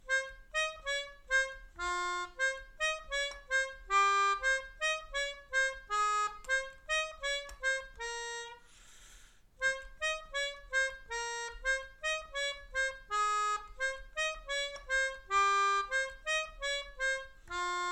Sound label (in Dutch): Mijn Melodie, Melodica